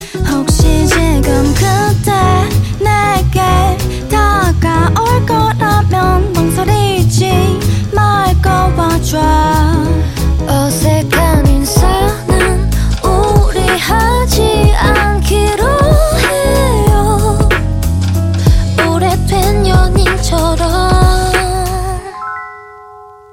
Jazz Bar; Pre Chorus

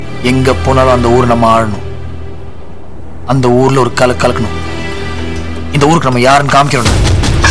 Villain Msg

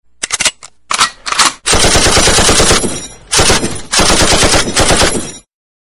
Machine Gun Sms

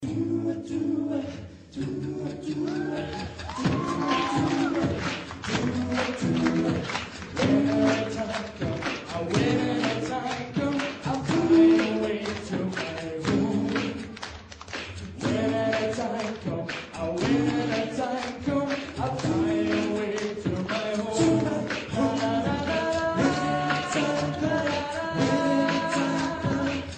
Lalala

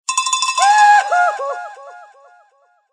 Yodel

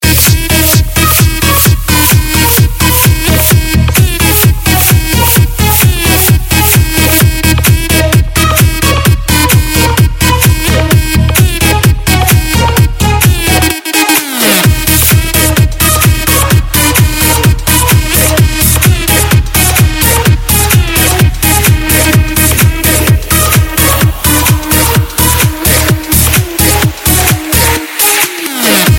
Dance Music

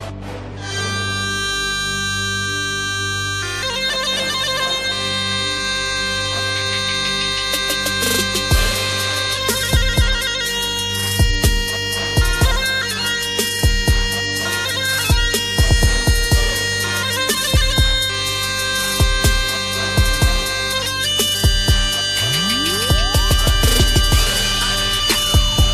Trap

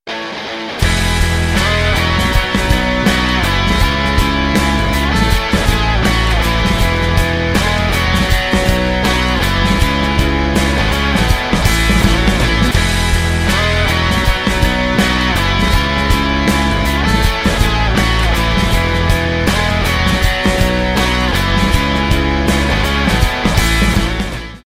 Guitar Loop

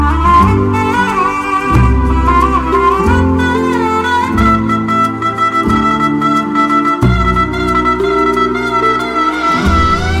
Tango

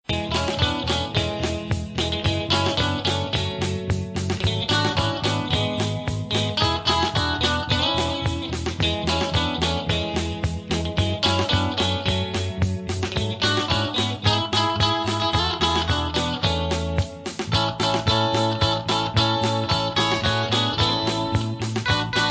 Iphone 4s Bell Royal

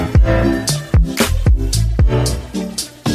Fantasy Tone

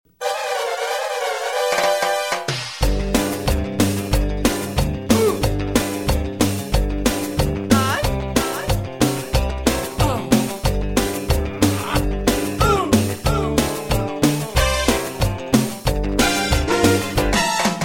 cumbia

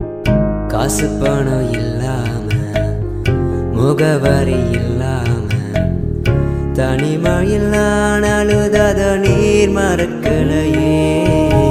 tamil christian ring

